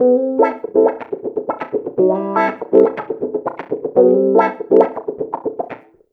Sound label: 120 WAH WAH, Electric Guitar Loops